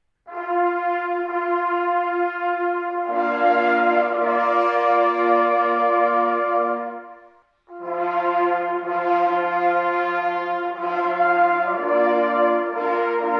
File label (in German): Fanfare